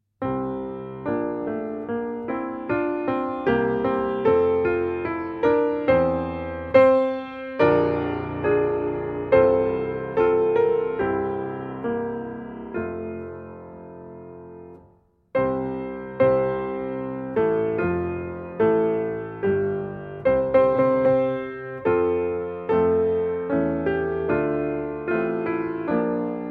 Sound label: piano